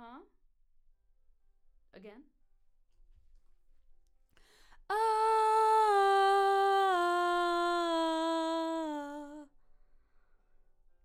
BG Vox

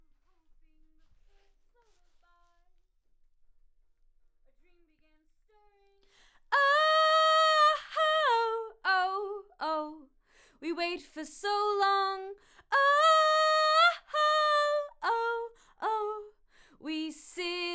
Vox start new

